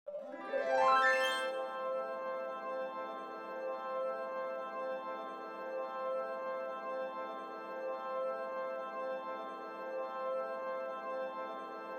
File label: ambient